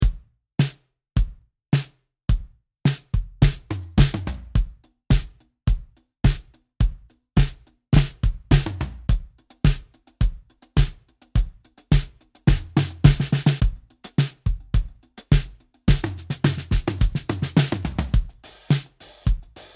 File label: Modern Disco; ALiveLoops; Modern Disco VOL.1